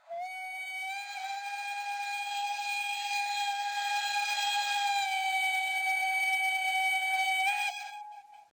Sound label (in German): Dampfpfiff mit langer Schleife; vorne rechts; Sounddateien mit 5.1 Lautsprecher Kanalzuweisung